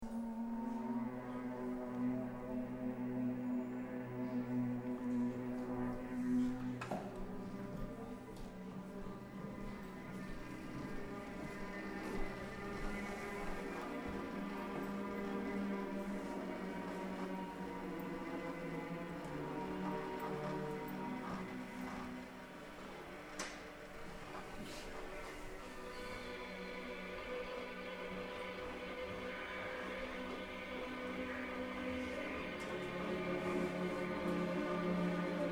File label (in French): pleurage bande